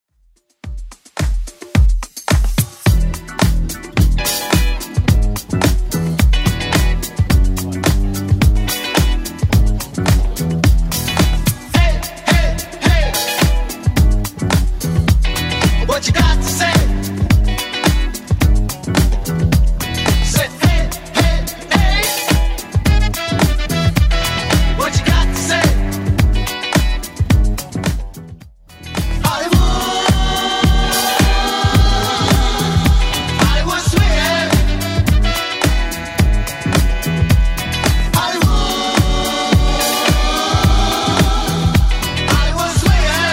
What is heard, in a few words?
80s Club Edit 108 bpm